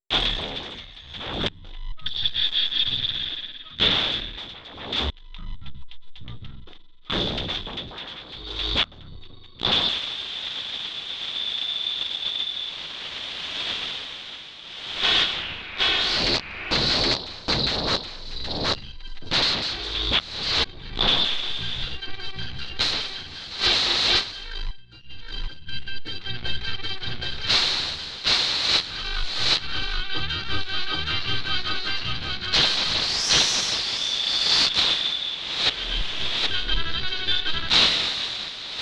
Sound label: ensemble